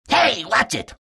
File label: pain; rattletrap